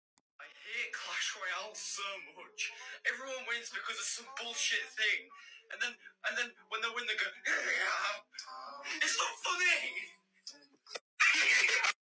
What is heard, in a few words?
heheheha